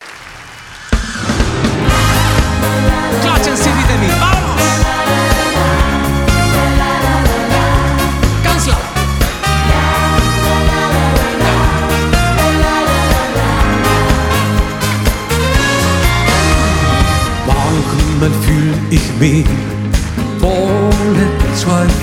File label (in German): Schlager Medley Live; aus Berlin